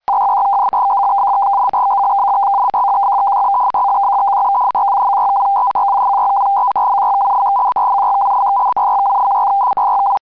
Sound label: PACTOR1 FEC